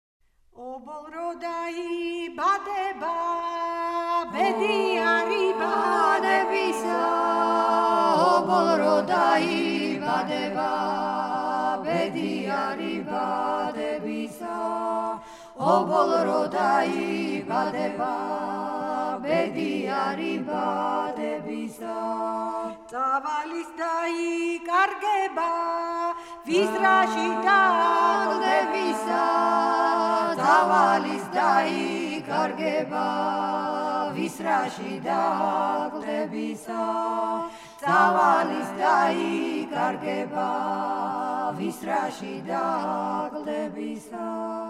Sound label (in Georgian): obol ro daibadeba chivili tianeti; Mzetamze